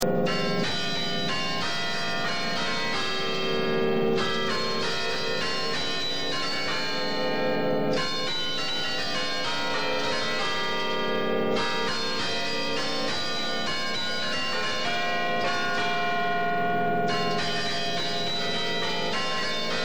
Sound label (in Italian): carnevale, campane